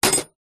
industrial small metal hand tool drop into metal tool box